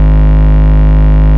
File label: Bass, Root G#; Vengeance Samples